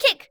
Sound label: high kick toe, soccer; HeavenStudioPlus; spaceSoccer